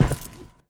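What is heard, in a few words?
footsteps metal soldier walk; sonniss gdc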